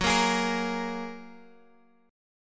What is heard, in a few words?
Gb Major Add 9th, down, Guitar, Open G